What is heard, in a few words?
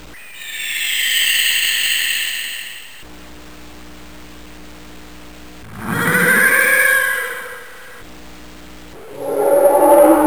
Roars; Saban; Thunderzord